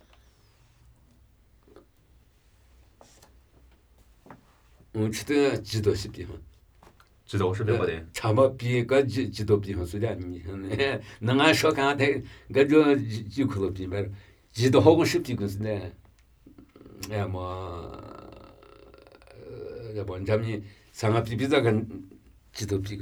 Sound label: Namuyi Tibetan Ritual Chanting